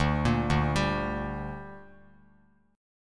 D Suspended 4th Sharp 5th D down Bass Standard, chord; arpeggiated